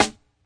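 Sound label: classic hip hop snare; Classic Hip Hop Mega Kit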